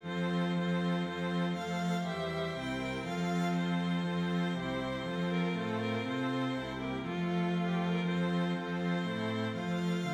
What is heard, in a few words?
Hymn Strings